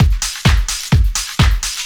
Latin Loop 128 BPM

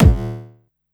bass drum, Drum & Bass

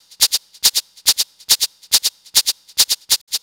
NRG Percussion, Trance

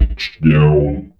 Korg, FX

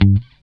GUI G2, E-GUI MUTED; Sound & Vision; Gigapack I CD 2

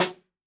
jungle snare chromatic, real drums; ProSamples vol.43 - Real Drum Kits

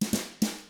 train; ILIO; Double Platinum Drums 1

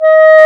WND CLA-D, Clarinet Sh; Roland LCDP04 Orchestral Winds; 90 s Sample CDs

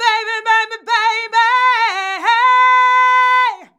"BABABABY", FEMALES; Best Service; Hyper Dance

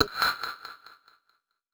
Echobrations, FX Atmospheres; Zero-G; Soundclash Nu Dance Electro; Acidized Wavs

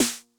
Snare Accent High Tuning High Tone Max Snap, 909; classic machines

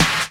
KIT, Dance Mega Drums, HIP; Best Service

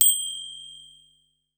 mondira, Yamaha Motif ES drum machine; 300 Drum Machines; Yamaha Motif ES-Series

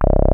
BASS, BASS STATION; Syntec; Wall of Sounds

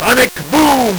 LSDj, Nintendo Gameboy; 300 Drum Machines

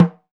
BOMGO MUTE, AFRO PERC; EdgeSounds; Drum Mashines VOL-1

